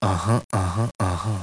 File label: uh uh uh uh uh uh, vocal